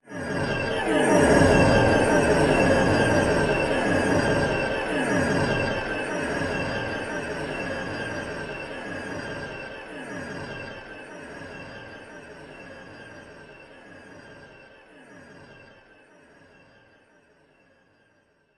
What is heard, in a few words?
delay movement atmospheric; sonniss gdc